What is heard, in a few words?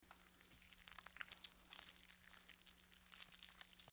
slime move